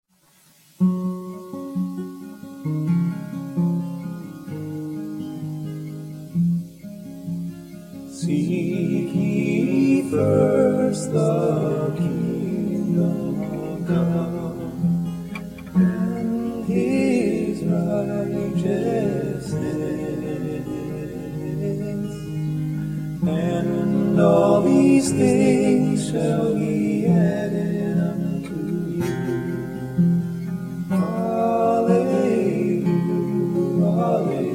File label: Guitars and Two Voices